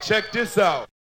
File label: Hip Hop Vocal